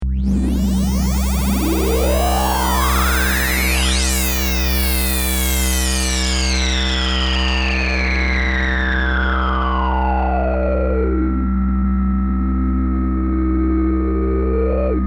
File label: fm vermona; per4mer